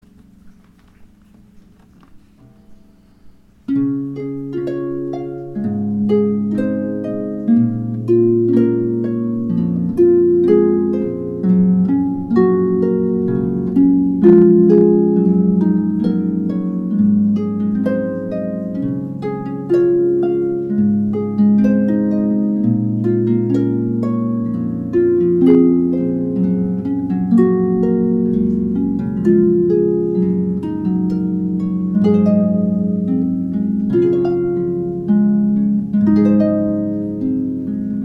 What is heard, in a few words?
Canon in D, Wedding Harp Music